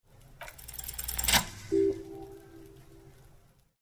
check positive uisound

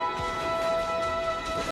run on sand; ori and the blind forest

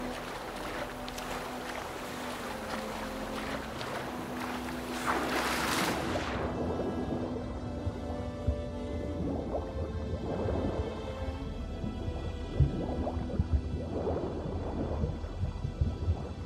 ambience; The Witcher 3

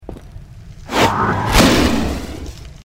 magic; The Witcher

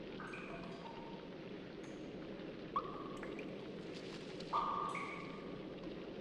waterdrops; limbo

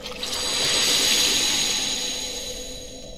death special enemies; Dark Souls III

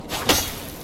katana miss; Dark Souls III

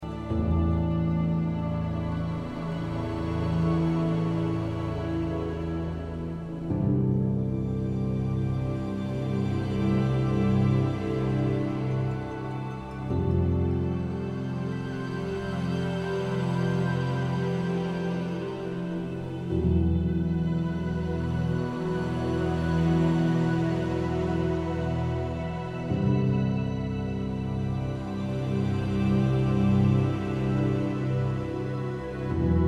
crossroads quiet; hollow knight

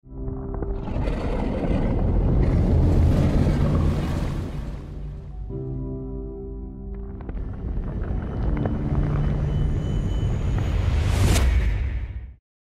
black substance; gris

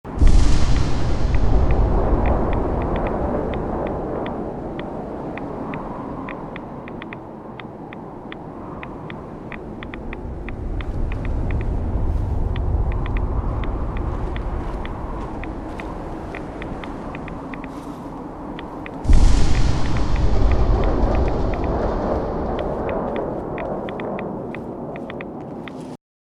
weather thunder; fallout 4

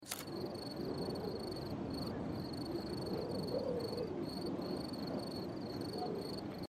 evening hintergrund; life is strange

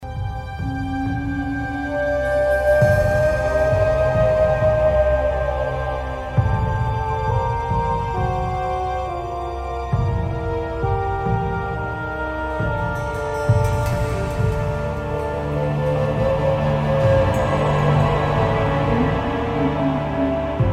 music city night; baldursgate

